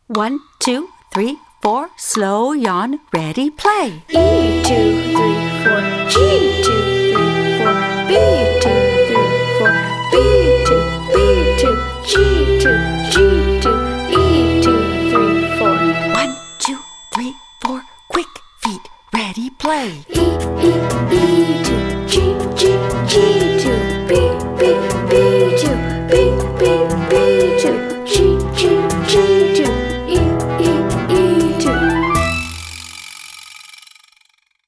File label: E G Bs Morning Warm ups